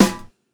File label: Snare